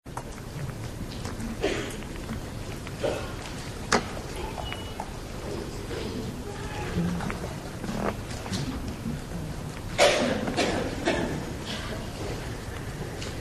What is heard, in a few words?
silence